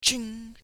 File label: Ching; Assemblee SFX